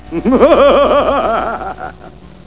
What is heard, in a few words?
moohaha